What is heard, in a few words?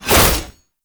Naginata Whoosh, Weapons Melee; Warframe; Koumei Naginata